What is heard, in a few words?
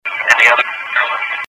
ghost box